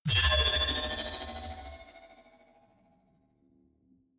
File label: sci-fi magnetic sling big high; energie